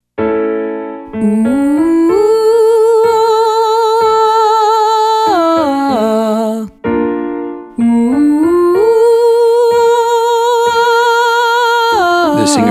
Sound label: Exercise ooo to ah female; Singing